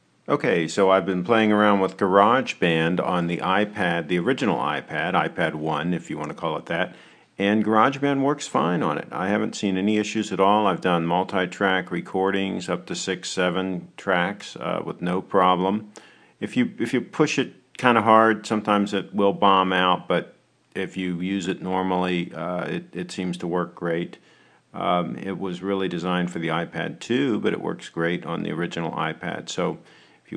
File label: garage band ipad